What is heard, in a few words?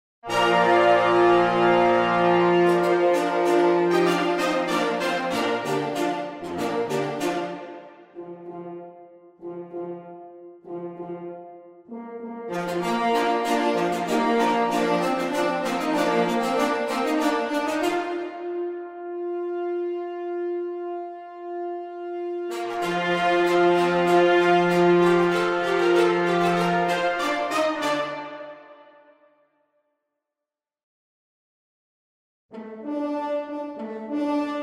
volume brass